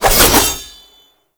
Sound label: Passive Sickle Spin; Warframe; Dagath